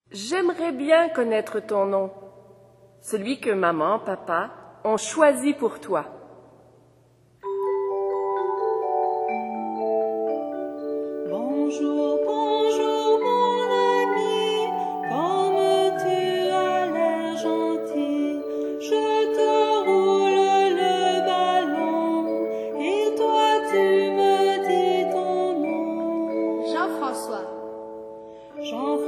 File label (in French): Chant de présentation